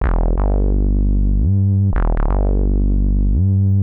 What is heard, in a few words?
125 res synth bass E; PowerFX - Nu Jazz House